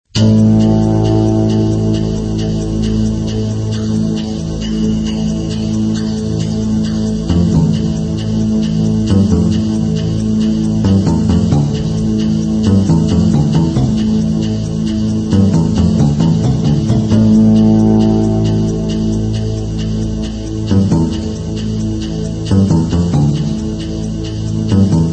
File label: Ambience Tension